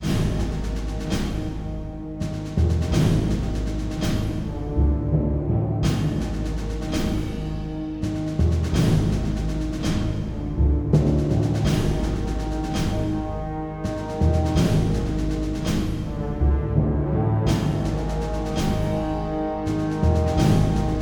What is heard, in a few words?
Military Suspense; Wolfenstein Return to Castle